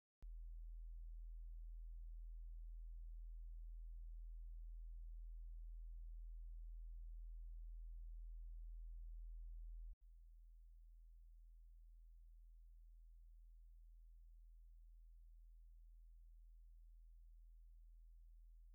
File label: Noise Modulation Test Tones with dither (L+R); Stereophile Test CD, Vol 2